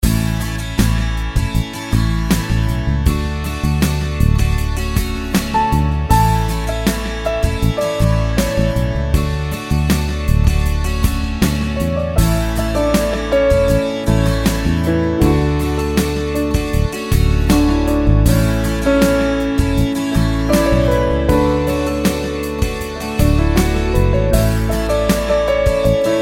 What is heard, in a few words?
SUNNY POP